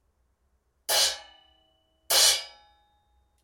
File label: 16in EFX, cymbal